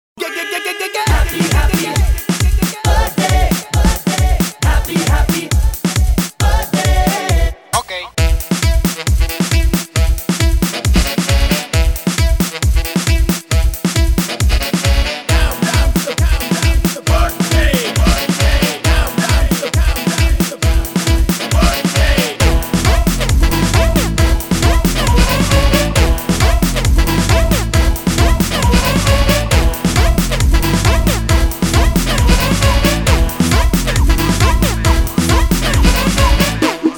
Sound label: Remix